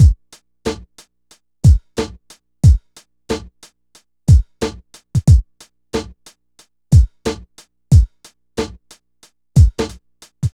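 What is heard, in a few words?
drum, Hip Hop & RnB; Makin Moves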